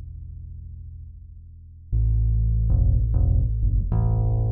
BASS

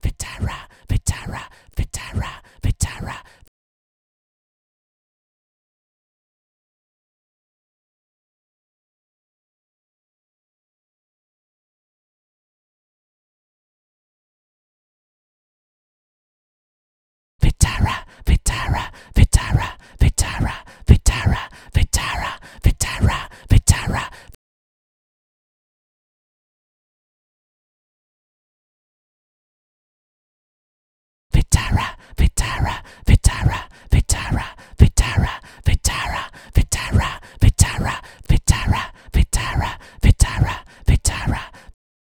chant

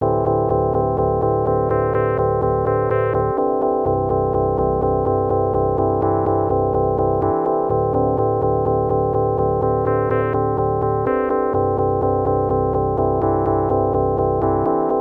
Ext. Instrument; juno9

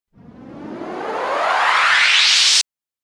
120bpm acceletrate

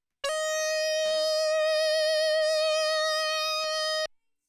moog

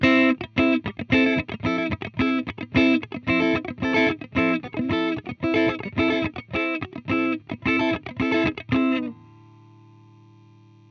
GTR

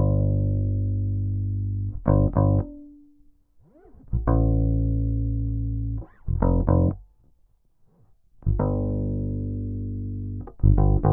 BASS

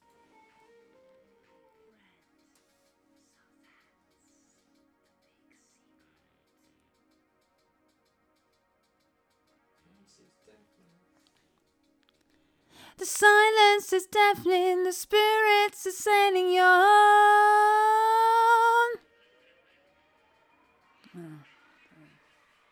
vox

